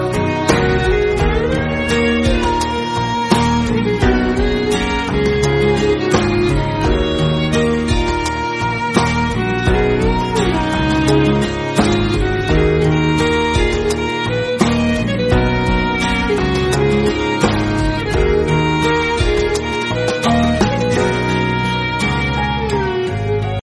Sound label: romantic